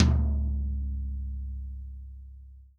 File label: TOM, Real Drum Kit, POP KIT